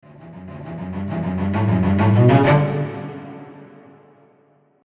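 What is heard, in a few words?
Piano Roll, cello, crescendo; velocity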